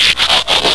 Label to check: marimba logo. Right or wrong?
Wrong